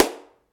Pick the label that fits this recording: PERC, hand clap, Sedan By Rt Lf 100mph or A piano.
hand clap